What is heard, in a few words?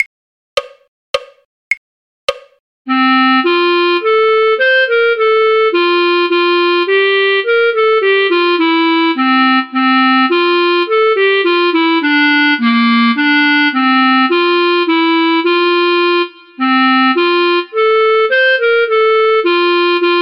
clarinet; the ash grove; folk